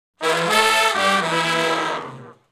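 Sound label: Shuffle Blues Riff (E) unison falloff w growl, Horncraft for R&B Sections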